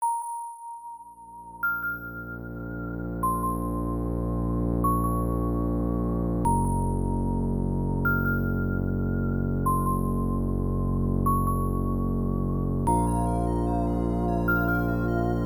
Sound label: Howl of Crow; Black Bird Tori Tachi no Tooboe; PC-9801